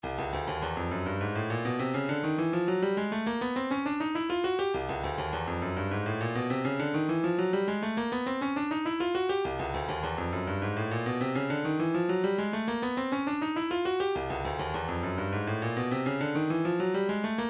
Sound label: lfo wah